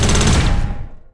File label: auto can; StarSiege